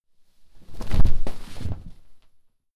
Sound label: clothes low hit drop